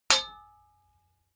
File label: sword metal hit ring